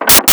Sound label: Open and close the lock